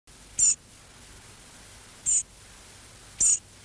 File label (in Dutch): merel, trekroepjes